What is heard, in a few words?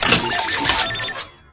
hat dispenser sound; lego star wars